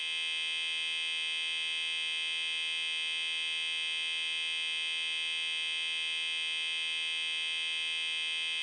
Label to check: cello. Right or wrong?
Wrong